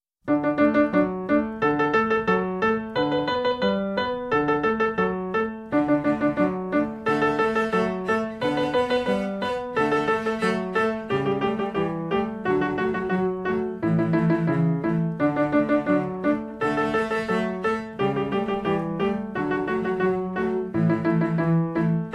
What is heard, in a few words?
Twinkle Twinkle Band